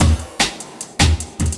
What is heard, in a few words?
LOOP, 150 BPM, Drum & Bass